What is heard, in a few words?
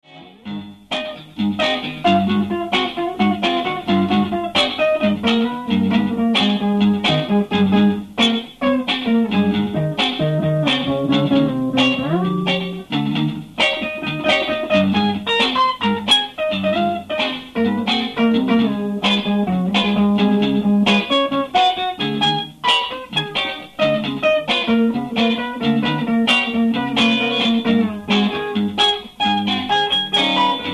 worksong solo; lespaul